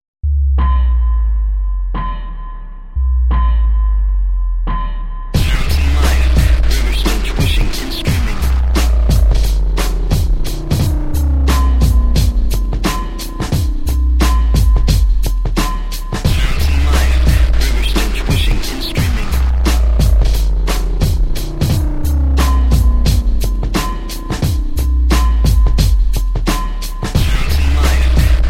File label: industrial; Beige